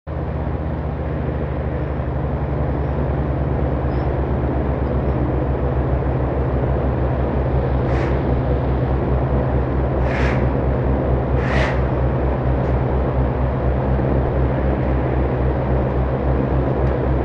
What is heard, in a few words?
ambient noise air